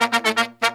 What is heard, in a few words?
Pop & Funk Brass, 120